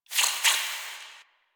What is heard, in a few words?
Interior Hiss; Ambience; Misc Indoor; DE; Warframe; Infested Eris